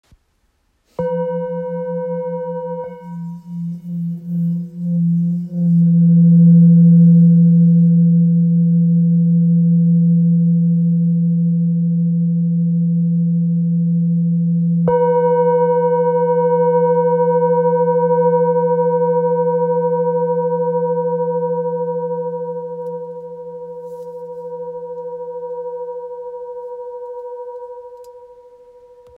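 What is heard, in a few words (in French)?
bol full moon fa, bols chantants tibétains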